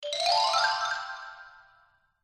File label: wipe